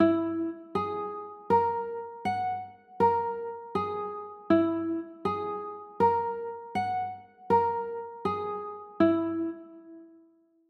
f sharp 9

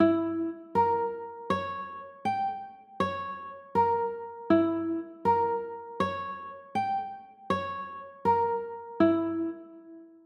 g dim, chords; g dim7

